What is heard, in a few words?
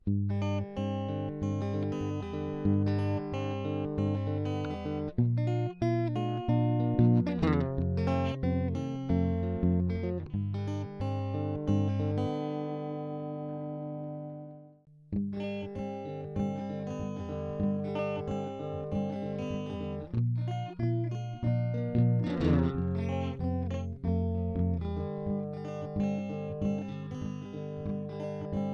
CD Delay